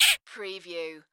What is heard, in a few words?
Bird Squawk; sound-effects-bible